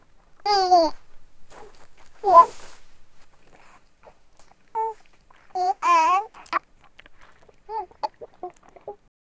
a baby, baby crying

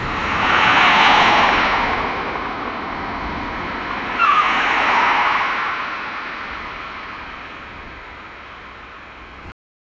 road accident

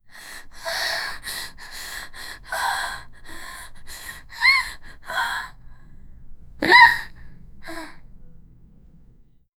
teenage girl belch

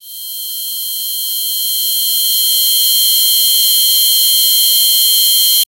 beeper pager sound effect

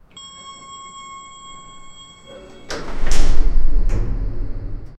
sounds of elevator going

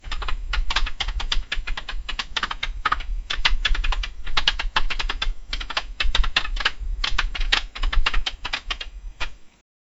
two old rusty robots walk

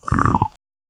sudden hollow skull roar, teeth snap

